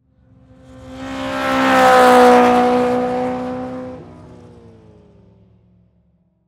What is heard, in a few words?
Race Car Pass By; Sports; Boxing Sound Effects; SoundEffects-BlastWave